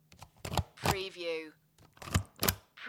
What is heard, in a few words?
rubber stamp twice